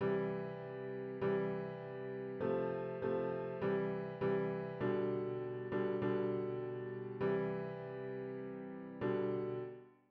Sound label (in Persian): piano chargah